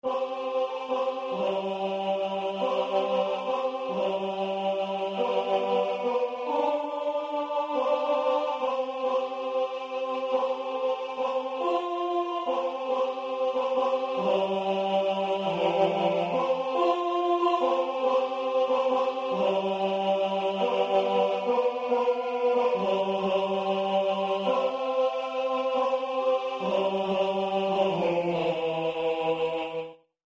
stille nacht tenori 3 strofa; Silent night

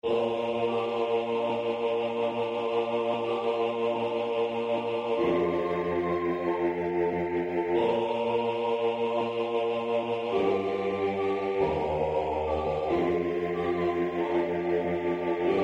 stille nacht bassi 3 strofa; Silent night

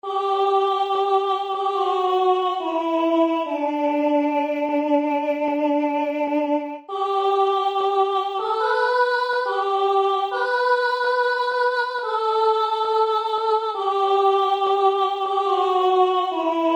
Soprani; BELLE ROSE DU PRIMTEMPS

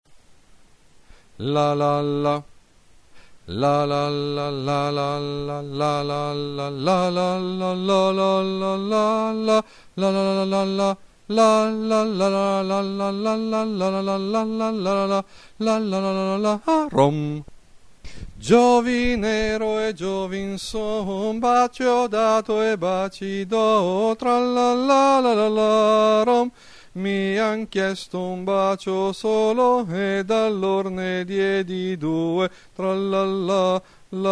contralti

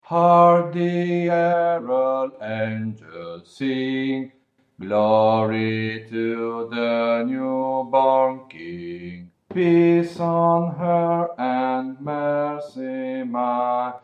BASSI; HARK THE HERALD ANGEL SING

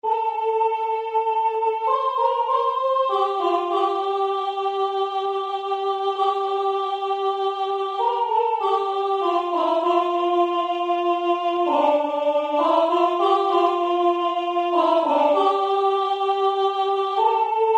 Caresse contralti

